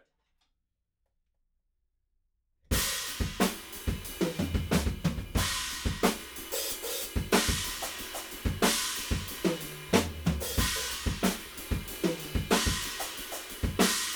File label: Room